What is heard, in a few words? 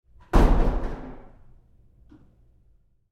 Door Bathroom Stall Impact Roomy Bounce